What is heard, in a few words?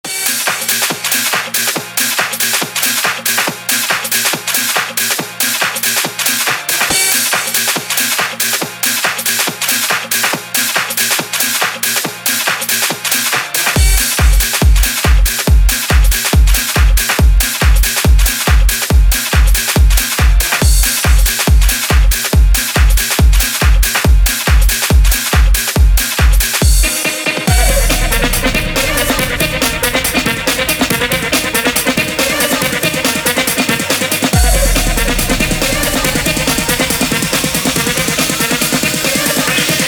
DISCO